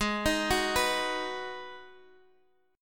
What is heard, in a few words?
G sharp Minor 7th G sharp; G#m7 arpeggiated; x,x,6,8,7,7